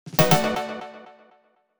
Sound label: Jingle Start Challenge; Amiibo